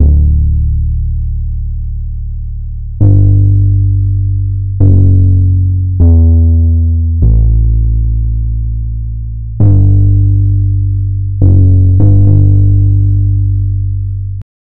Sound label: a distorted and punchy